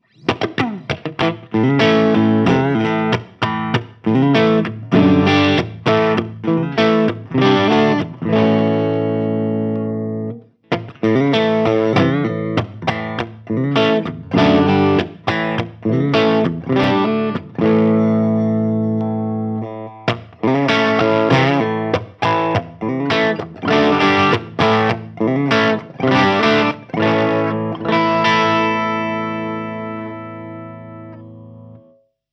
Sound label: Clean 3 Way Switch; RR X Series